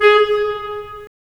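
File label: wind clarinet; Roland - LA Composer vol. 3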